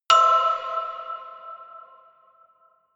notification glockenspiel creepy third

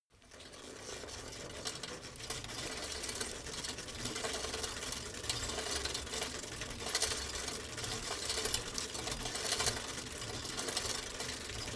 bicycle rickety pedal slow constant

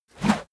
whoosh dirty; swooshes-swishes

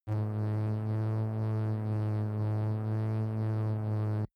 space buzzing energy beam

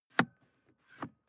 sports car int switch press and release; autos; verkehr transport

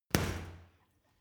boxing punching bag hit wiggle; sport-freizeit; boxen

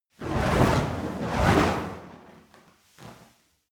fire torch whoosh flutter long; swooshes-swishes

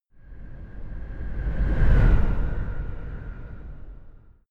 sci-fi vehicle train passing fast long; raumschiff

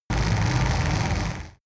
extrinsic buzz hard, energie

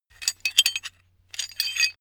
metal handling pieces

